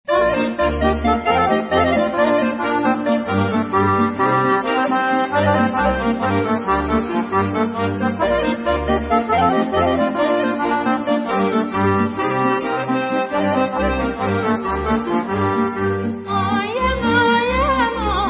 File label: Celebinsko Horo Bulgarian